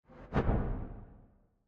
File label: Whoosh, Cinematic Sound FX; Marek Klemczak; ARTA Cinematic Sound FX Pack; Chef Audio